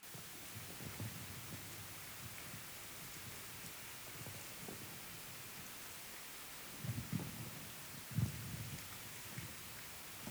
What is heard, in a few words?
Biome Noise Loop; The Aphotic Plateau; Music